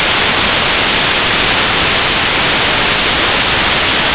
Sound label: WHOOSH